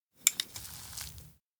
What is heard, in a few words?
chopsticks pick; anemoi